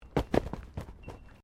Body movement Impact Roll Road

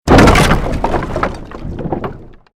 created wooden structure crash medium

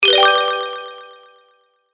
Win Bonus With Delay, video game sound effects

